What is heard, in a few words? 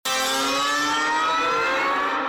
slide